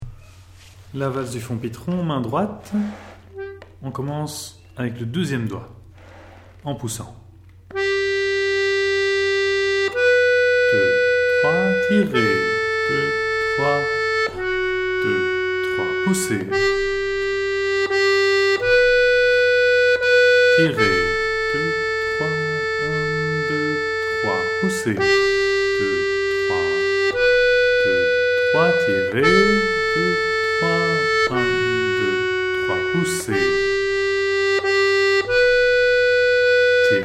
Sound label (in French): Valse MD, accordeon; Valse du Fond Pitron